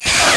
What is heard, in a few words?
force lightning start